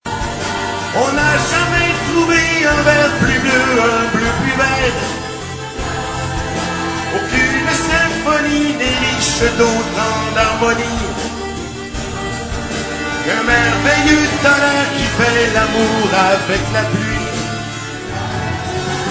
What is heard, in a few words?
SATB Solo